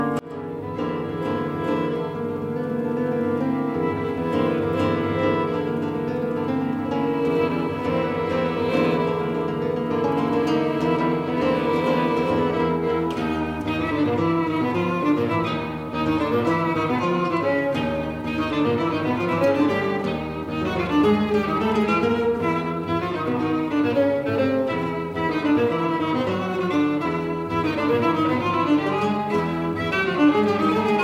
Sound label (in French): violon et guitare; RTBF